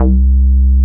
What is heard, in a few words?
SNGL.BASS, Jungle Rave